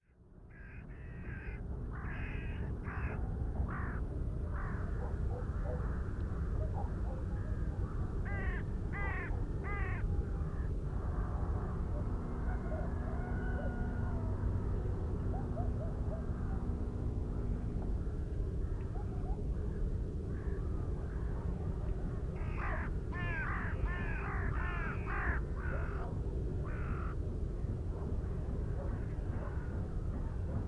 distant town ambience far distance distant city rumble village birds distant; sonniss gdc